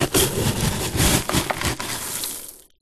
glass pieces thousands small broken wood dig in plastic tub; foley; zapsplat